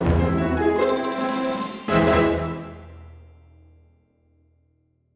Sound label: Jingle Lose